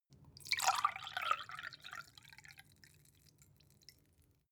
glass pour soft; soundpool

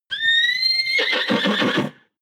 horse neigh agitated; natur-tiere; pferde

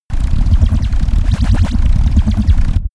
space laser blaster cannon damped; krieg-waffen; soundpool

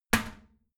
toilet seat lid slam heavy; haus-garten

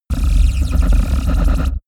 space laser blaster massive; krieg-waffen; soundpool

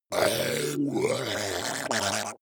zombie grunt choke male; kreaturen

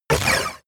sci-fi laser shot short; krieg waffen

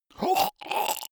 monster choking zombie short; koerpergeraeusche; comedy-cartoon; soundpool

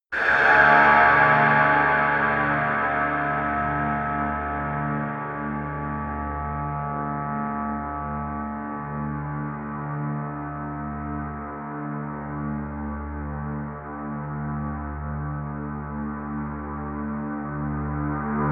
promo pad; whooshes-rises; hits-impacts

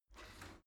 shower slide door slide short open

